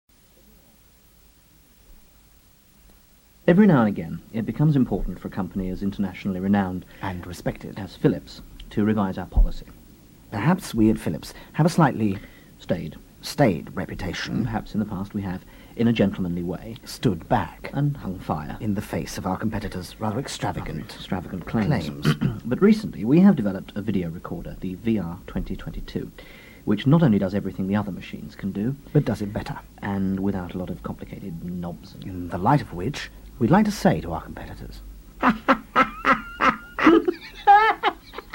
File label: Laughter